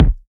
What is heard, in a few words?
clean steel kick drum sample d sharp key